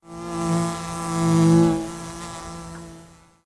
Insect Buzz

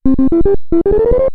Restart; Mario Bros.

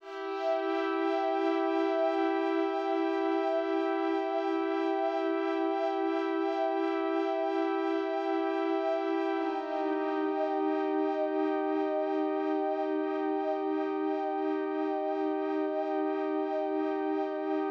Pads; super crush k.o.

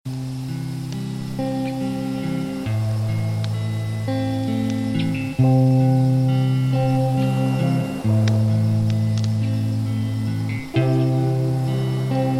Campfire Theme Loop; dead in bermuda windows gamerip 2015

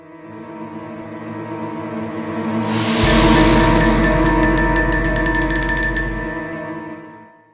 stinger; World War II Combat: Road to Berlin; Xbox

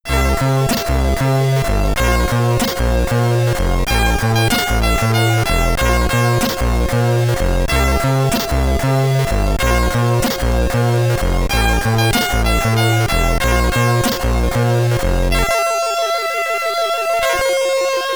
Game Over (SID Stereo); Forester; commodore 64

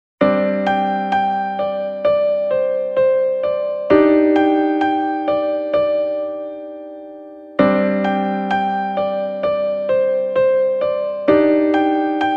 Calm; Koibumi Romantica; Soranone BGM best selection

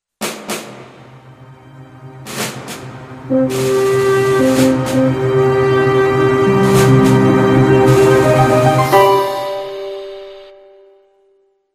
stinger; World War II Combat: Road to Berlin; Xbox

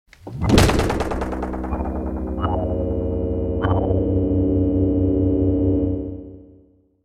Game Over; WrestleQuest

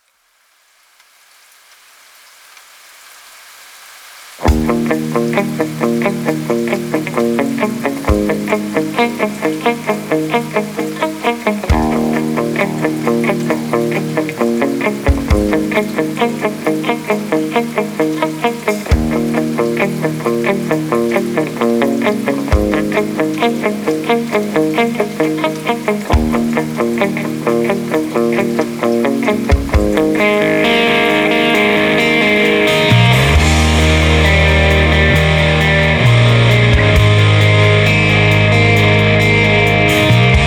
rainy; Petrolatum box

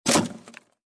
Checkpoint; iStunt 2

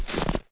Footstep Snow

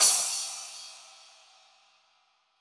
Cymbal Hit

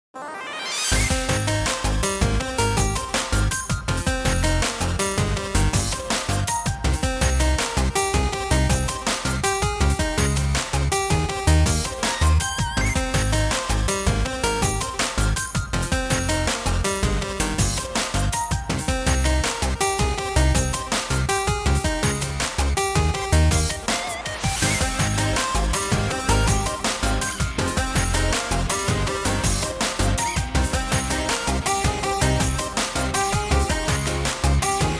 Clocked Out (Victory); 30XX

